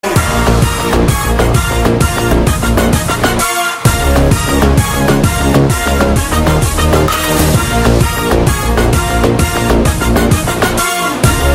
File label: Theme Ringtone